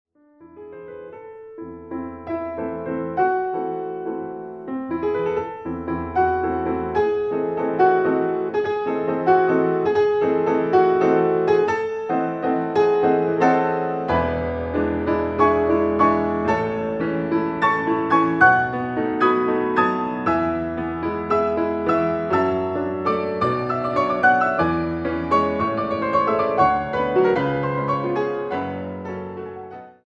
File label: Ballad g moll, Piano; Frederic Chopin